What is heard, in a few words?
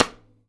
Side Stick Hardest